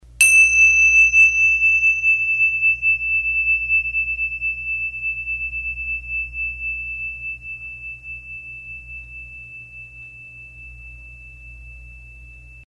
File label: tingsha